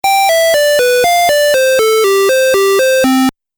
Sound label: Game Music Phrase, Video Game Sound Effects